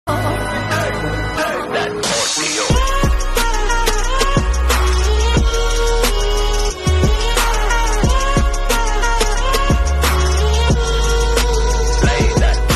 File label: Edm Music